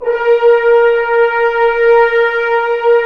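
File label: brass french horns, Roland; Roland - LA Composer vol. 1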